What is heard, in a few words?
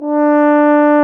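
brass baritone; Roland - LA Composer vol. 1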